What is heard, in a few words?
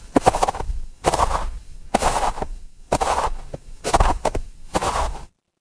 footsteps snow